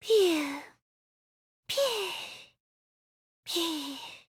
snore; monophanie

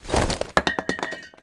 gun shell

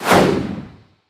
thought route choice in

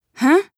"hunh"; toko; drae

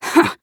scoff; nagisa

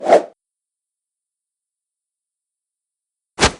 present

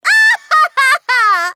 ahahahaha; miu

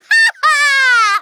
haha!; miu

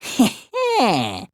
heh heh; hifumi

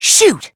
shoot

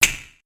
vortex snap

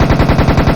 machinegun fire; p4u; aigis

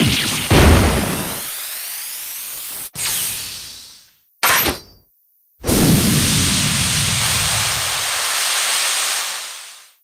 breakdown; sorin

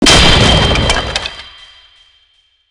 gavel break; vortexhd

